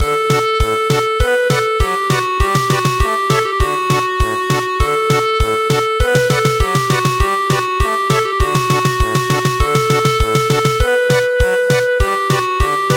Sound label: German Polka; Many Years